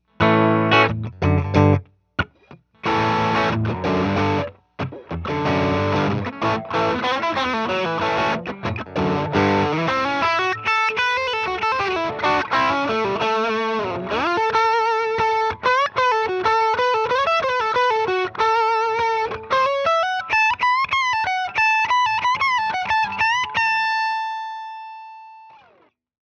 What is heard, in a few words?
FULL THROTTLE TS9